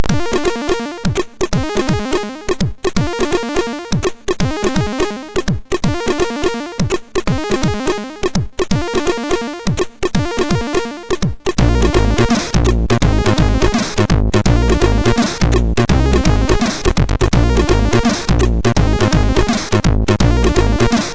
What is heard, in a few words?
Shortfunk, sid, MOS6581R2